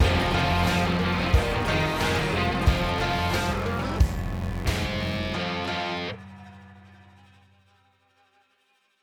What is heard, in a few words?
Outro 90bpm 4 Layers; battlefield hardline; ps3 ps4 windows xbox 360 xbox one